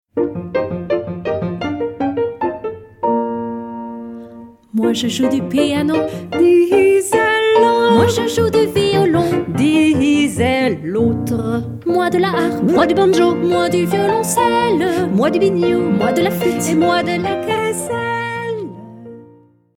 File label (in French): l orgue de barbarie